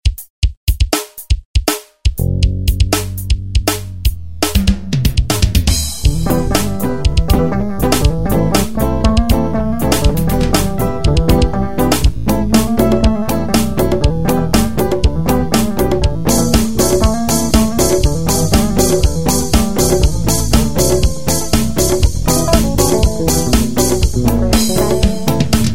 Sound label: tema regg